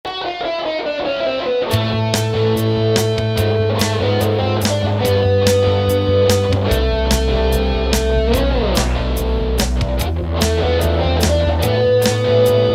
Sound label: Rock